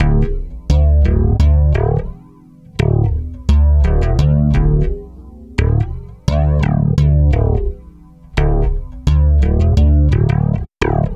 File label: Stick Loop, Stick Bass